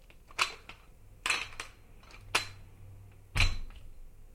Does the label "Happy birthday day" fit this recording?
No